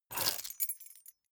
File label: key ring large grab table hard